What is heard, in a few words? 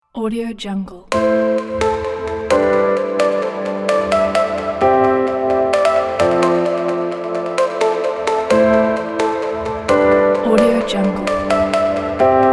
summer waves